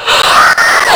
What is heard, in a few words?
normal alert; floaters; slender